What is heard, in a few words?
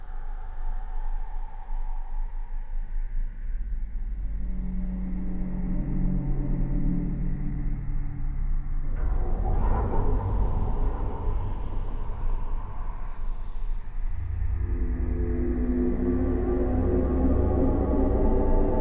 sun drone